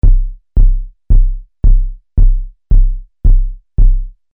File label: Kick Deckard VCO Sys 500 Filter